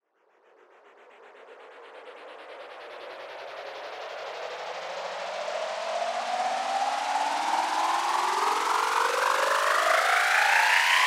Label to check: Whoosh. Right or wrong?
Right